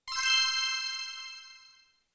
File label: Achievement completed; RuneScape 3